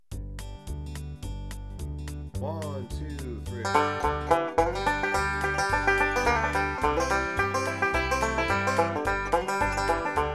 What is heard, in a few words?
Nine Pound Hammer, banjo